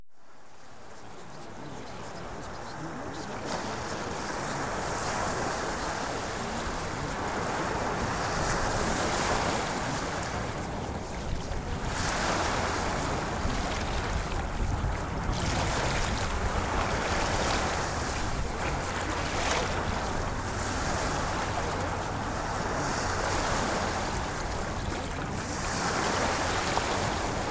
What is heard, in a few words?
Ocean Waves